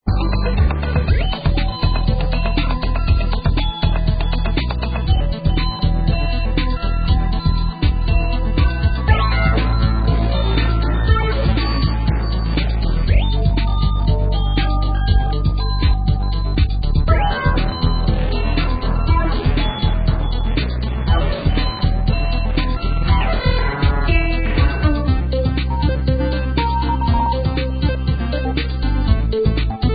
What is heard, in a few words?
Ambient